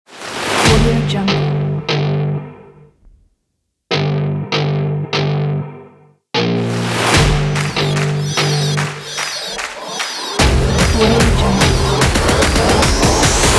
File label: epic sport action